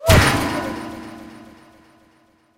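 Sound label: kill scene ricochet, tanks; War Thunder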